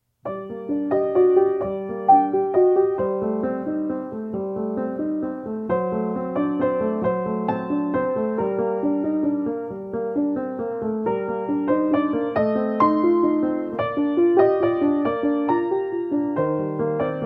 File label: siciliano